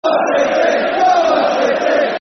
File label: Football Chant (Soccer Song); Come On City; Coventry City Ccfc